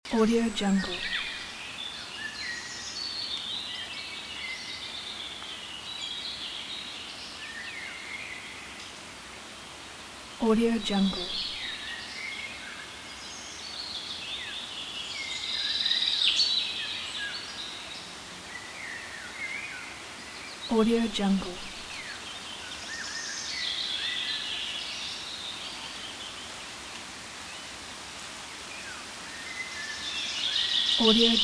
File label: windy summer forest with birds loop; fxprosound